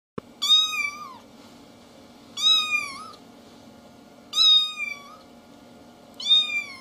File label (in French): chat